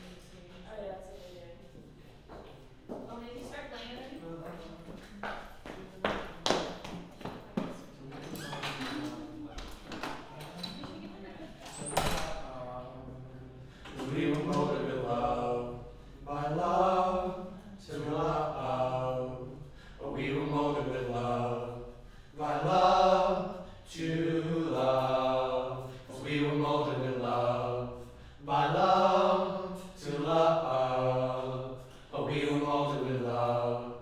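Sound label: Choir Harmony Low L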